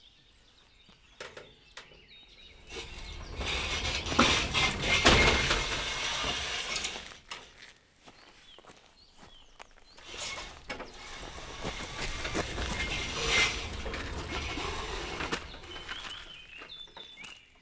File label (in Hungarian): sínen futó bejárati kapu nyitás és csukás; vízház; cardioid